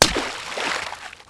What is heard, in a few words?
gun water impact